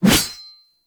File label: Sword Swipe, Melee Whooshes